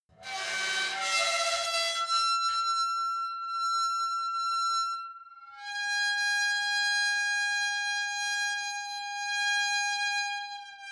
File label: metal squeal saturated long